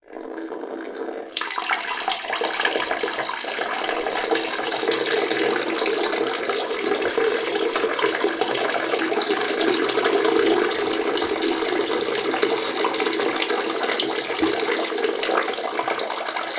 human urinate into toilet; sound effects one

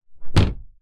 household door open loft hatch jump

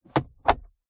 car luxury glove box close; vehicles; zapsplat

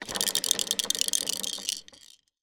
toy plastic disc spin roll

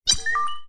science fiction door code unlock

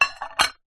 household metal pan set down on plate

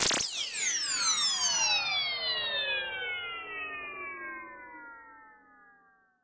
sound design effect strange ring; sound effects one

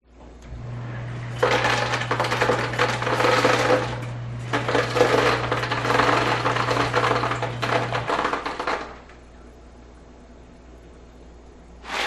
ice maker machine operate

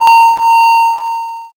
sound design feedback whine lo fi

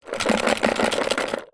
sport ping pong balls in plastic tub shake; zapsplat

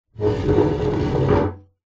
stool chair metal scrape drag wood deck; zapsplat; household

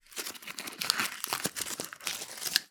food cake small paper cake case movement handling; zapsplat

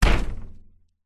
impacts metal cover medium hit

